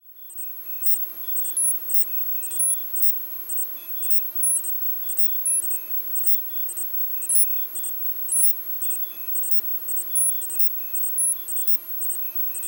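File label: Surveillance Truck Interior; Medical; Blastwave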